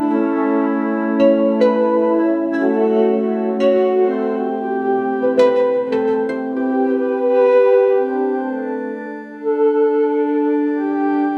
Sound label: mood pause softness instr